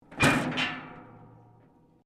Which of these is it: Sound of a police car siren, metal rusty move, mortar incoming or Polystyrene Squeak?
metal rusty move